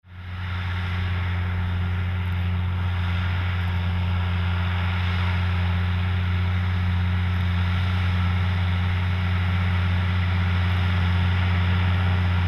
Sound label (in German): Fendt Favorit 824, Motor: MAN 6-Zylinder; Leistung: 230 PS